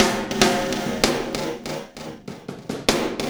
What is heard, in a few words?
Ripple Perc